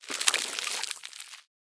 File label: Tentacles; smite; god bakasura